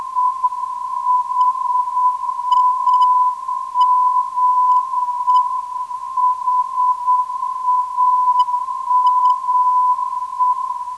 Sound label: 317kHz, NDB; HAM